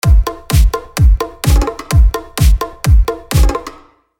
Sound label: Drum Loop